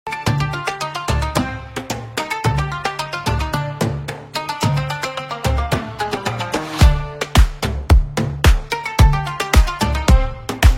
Upbeat Arabic Instrumental Music Happy Energetic Middle Eastern Beats